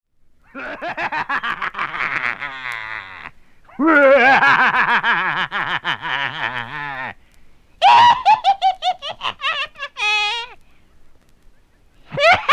Laughing Gremlins; Halloween; Haunted House

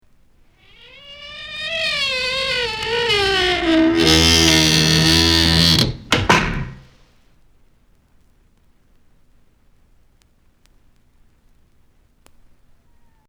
Squeaky Door; Halloween; Haunted House

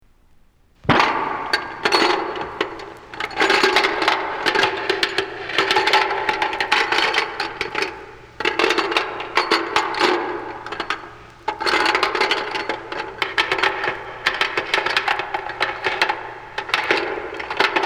Chains Rattling; Halloween; Haunted House

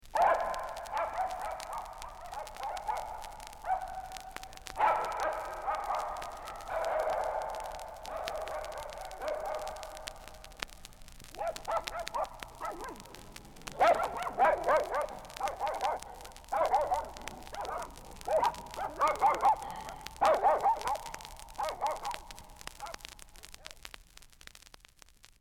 Mad Dogs; Halloween; Ghostly Sounds; Power Records

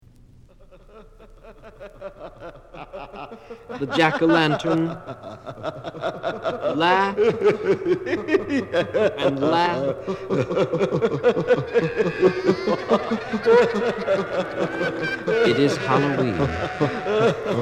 The Jack o' Lantern Laughs; Halloween

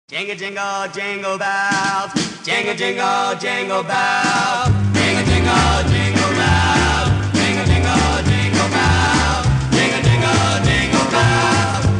Jingle Bell Stomp

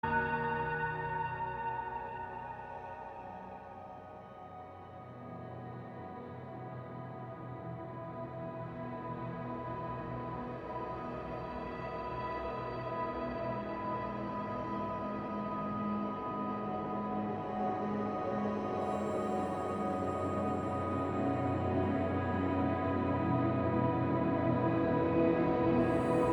Theta Waves, Ambient

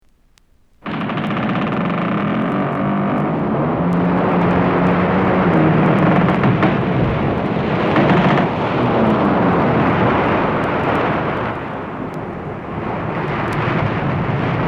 Creaks On Ship, Haunted House; Halloween

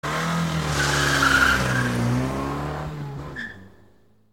Christmas Jingles

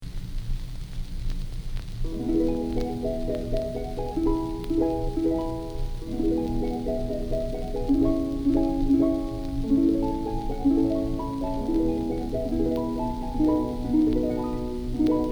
christmas hymns harp solo

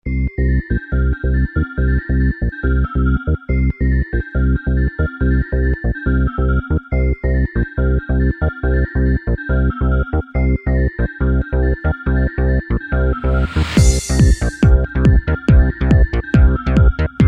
techno xmas; Christmas

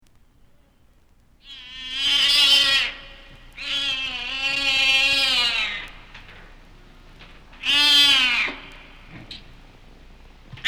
Shrieking Cats; Halloween; Haunted House

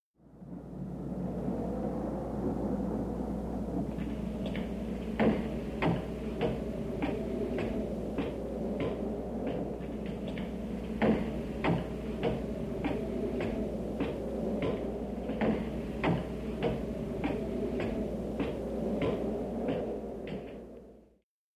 Footsteps, Sounds Of Horror; Halloween; Halloween Sound Effects - Sounds Of Horror

